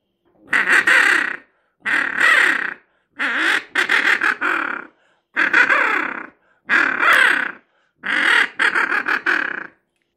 свиязь утка

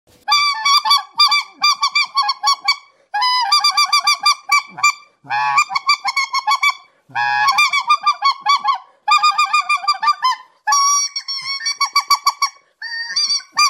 белолобый гусь язычок с высоким тоном

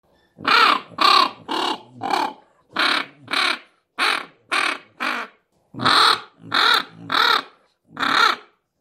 красноголовый нырок утка

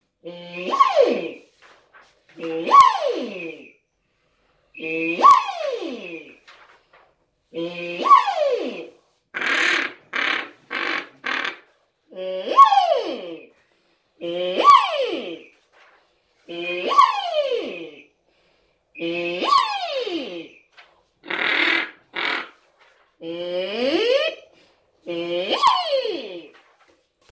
красноголовый нырок селезень утка

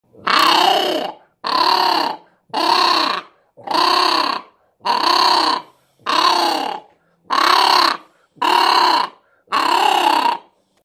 турпан

